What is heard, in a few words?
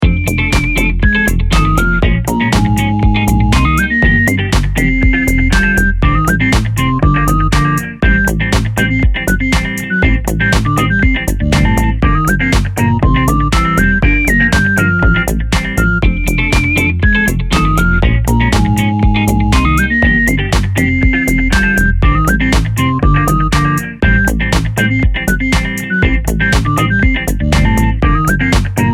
Guitar chunks; FlowComposer